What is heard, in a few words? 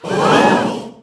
Crowd Gasp